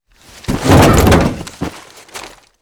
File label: foley npc car slide cover